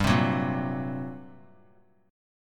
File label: G Minor Major 7th Double Flat 5th, G; strummed; JGuitar